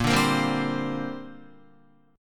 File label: A sharp 6th Add 9th, Guitar; A#6add9 strummed